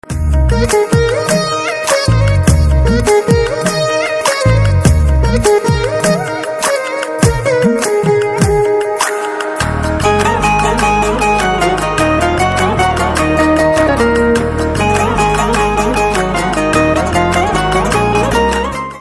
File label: BGM Guitar Ringtone